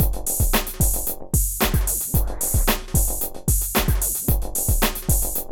PHATT BEA

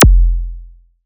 ZAP, ZAPS THUMPS; Best Service Alpha Dance vol.2

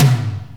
ROOM TOM

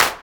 Clap; Equipped Music; Loungin House

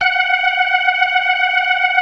ORGAN, 4 DRAWBARS; Keith Emerson Signature Series

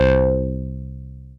GEMINI SYNC, prodigy; TECHNO-BWARE

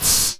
PSST, Anime Sound FX; COMIC

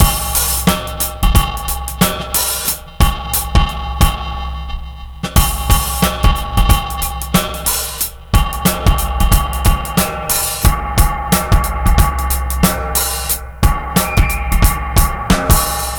Synthi Strut 90 bpm, breaks; Zero-G Beneath the Planet of the Breaks

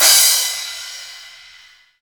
CRK CRSH, Hip Hop; E-MU Formula 4000 Series Vol. 1; Hip Hop Nation

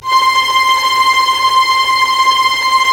STR, Roland LA Composer; Roland - LA Composer vol. 1

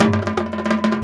INDIA R, Heart of Asia, IND ENS

